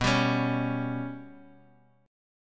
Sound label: B Suspended 2nd Sharp 5th down Guitar Open D